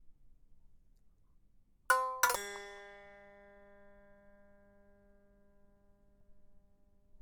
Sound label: Orient String Hit